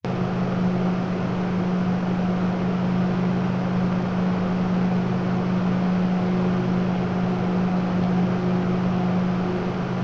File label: Light Wings 140 Max RPM